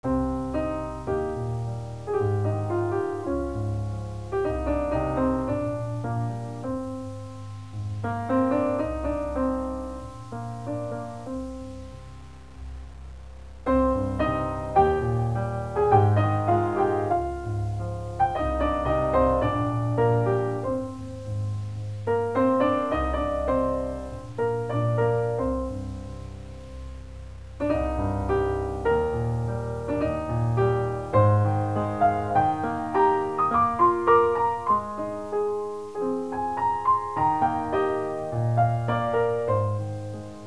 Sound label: Piano